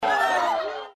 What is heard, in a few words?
suprised kids